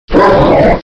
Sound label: wolf attack; slender; marauder